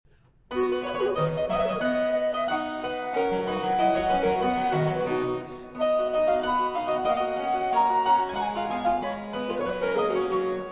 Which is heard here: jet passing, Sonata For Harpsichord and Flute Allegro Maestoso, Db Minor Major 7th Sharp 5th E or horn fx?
Sonata For Harpsichord and Flute Allegro Maestoso